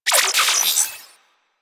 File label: Digital Glitch; Ambience